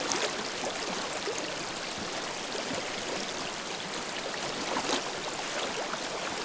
ambience water trickle pool; smite; conquest

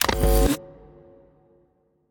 buildup; Smite; Baron Samedi

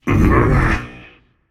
ugh, voicepack; smite; jorm

